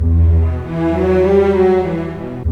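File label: 100, orchestra arpeggi; East West; Scoring Tools; Myst Arpeggi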